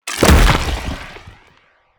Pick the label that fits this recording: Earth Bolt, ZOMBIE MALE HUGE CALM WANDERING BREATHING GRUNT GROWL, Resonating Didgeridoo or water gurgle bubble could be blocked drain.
Earth Bolt